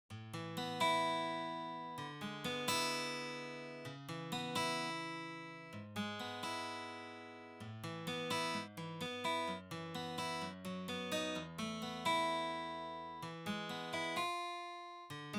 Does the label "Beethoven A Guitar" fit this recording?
Yes